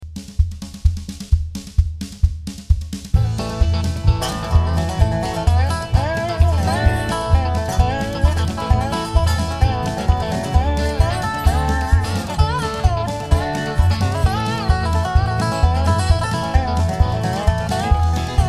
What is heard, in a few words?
banjo